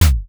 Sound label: footstep sneaker